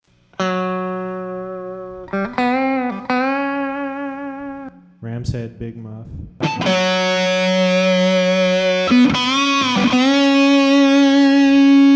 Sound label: EMG TONE SOLO MUFF GE7 CS2 CE2 NOVA